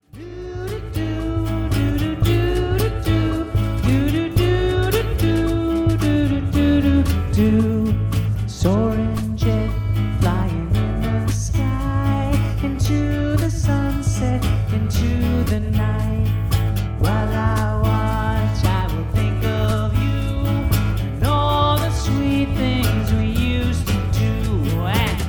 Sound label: acoustic snippet